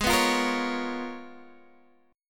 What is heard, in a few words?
C sharp 13th, G sharp